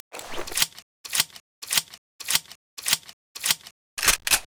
remi 870 reload; STALZONE

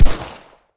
ump45 fire; STALZONE

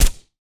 ots33 silencer; STALZONE

